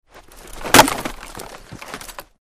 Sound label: Football Block Grunt, football